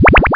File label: FLEX